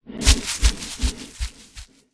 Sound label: forest hidden, creature; stalker